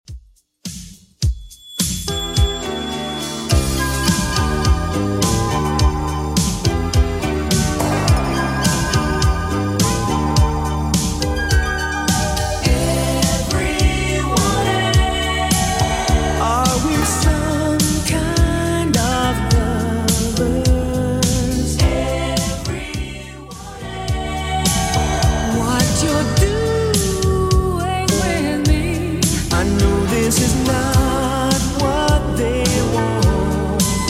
80s Redrum 105bpm

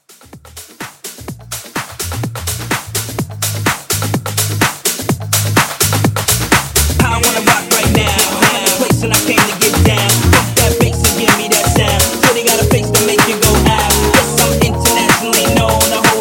House Rework 126 CLEAN

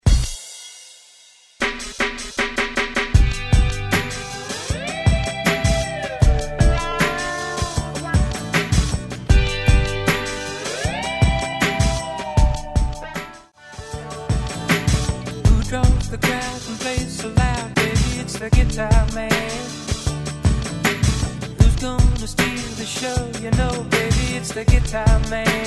70s; Redrum

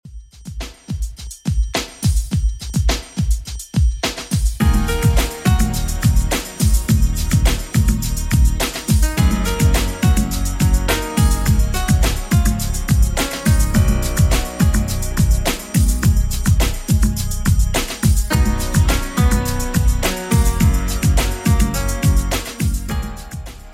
90s Redrum 105bpm

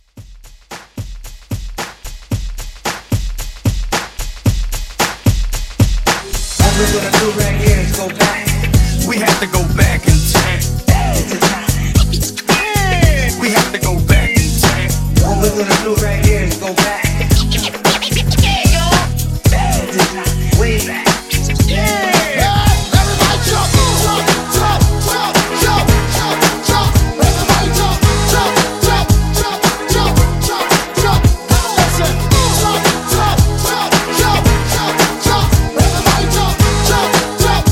Party Break Intro 112

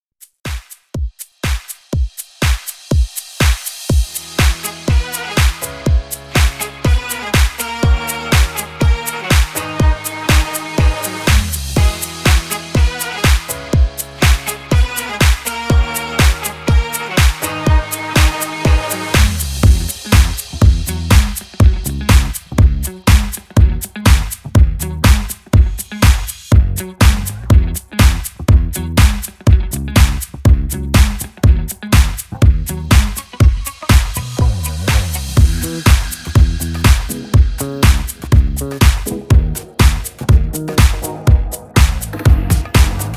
Inst 122 BPM

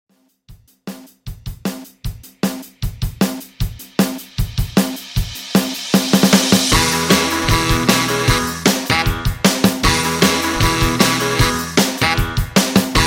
60s Dance ReDrum, 154 Bpm

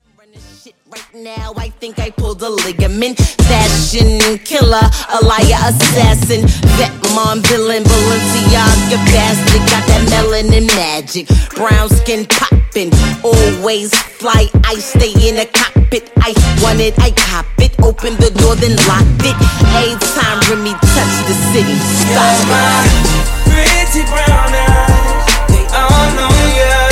Dj Intro Outro 74 Bpm

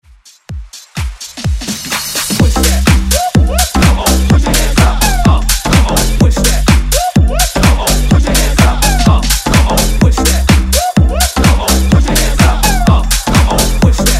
House Redrum

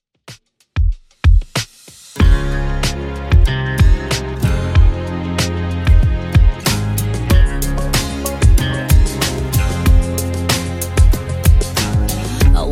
2ks Redrum 94bpm

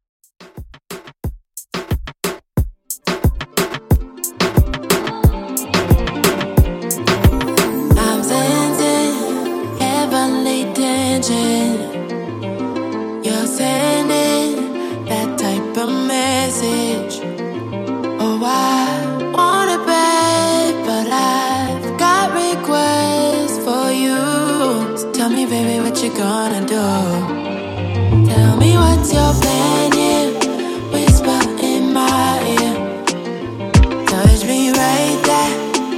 Moombah, 90 Bpm

